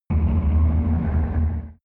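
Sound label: extrinsic rumble, energy; energie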